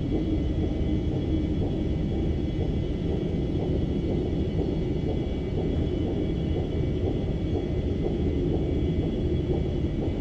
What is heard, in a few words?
arc machine, ambience